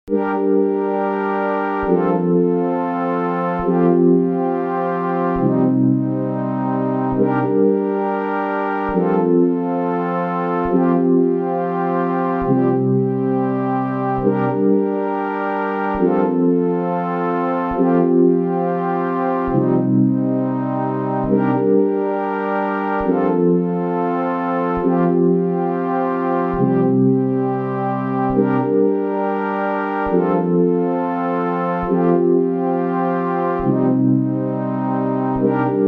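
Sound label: Noisy Chords Dry to Wet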